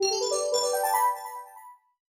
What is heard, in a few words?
News Flash Jingle